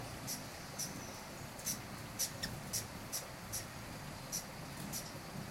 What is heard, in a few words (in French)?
ariane beryl, chant